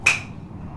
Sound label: Golf